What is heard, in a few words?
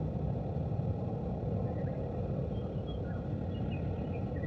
Ambience Loop, outdoor